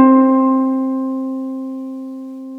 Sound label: HARP C3